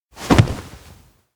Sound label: grab shoulder; awayuki; anemoi パッケージ版 1.1